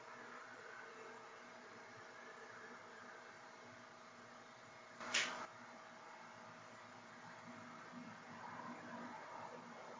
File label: Chapel Static; fairfield county infirmary return investigation